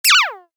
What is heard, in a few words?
give me that back, message tones